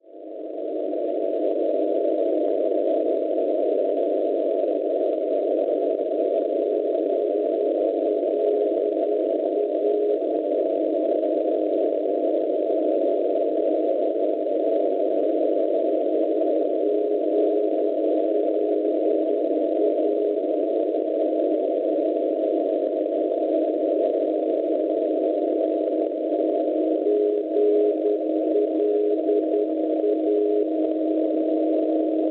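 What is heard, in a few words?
non-directional beacon 375 kHz; GLA; Gland; Svizzera; Schwarzsee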